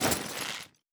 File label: Equip; movement